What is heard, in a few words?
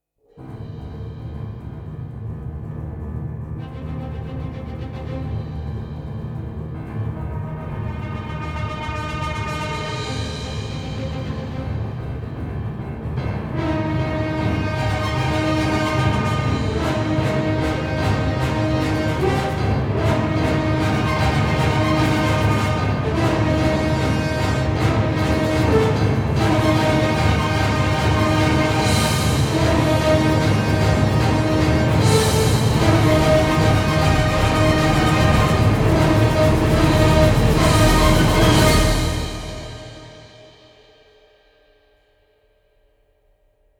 No Choir